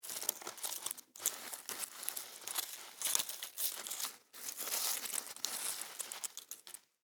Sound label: Insect Move Object On Dirt; Insect Movement; Animals; Insects